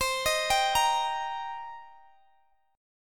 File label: C Minor 7th C Guitar Open D